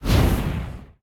projectile; smite; raijin

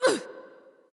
NV Ouch; smite; awilix

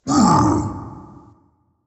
grunt, firegiant; smite

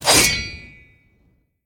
swing; smite; god arthur

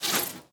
arrow flyby; smite; ahpuch skin10

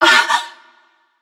surprised; smite; nemesis

